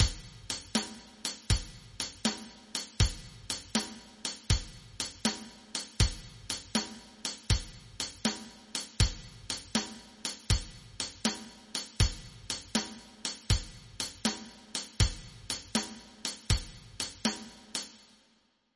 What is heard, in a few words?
western, rhythm; Creating Rhythms